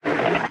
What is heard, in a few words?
arm retract, asteroid collector; Factorio